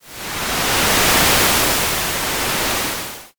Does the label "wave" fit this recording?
Yes